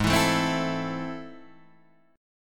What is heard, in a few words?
A Minor G sharp, Am/G# chord; strummed